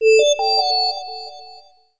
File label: Call Connect; Samsung SGH-i310; Phone Sounds